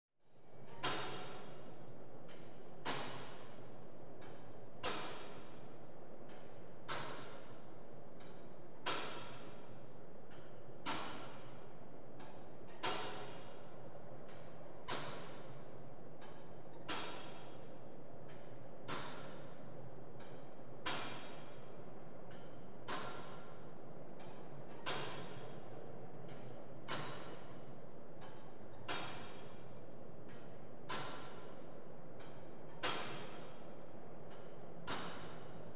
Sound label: Inside the clock tower, the ticking of the clock; watches